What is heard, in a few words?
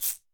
Torpedo, Alesis D4